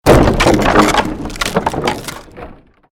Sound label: created wooden structure crash medium